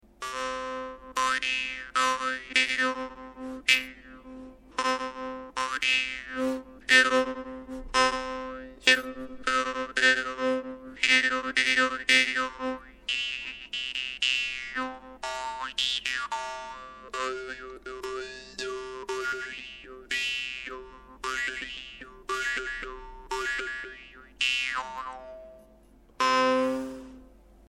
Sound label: doromb; hungry doromb Evangelist